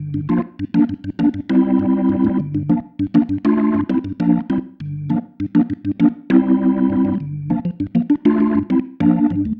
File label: Pattern, Organ